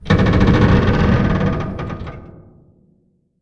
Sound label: ac130 metal stress; Call of Duty Modern Warfare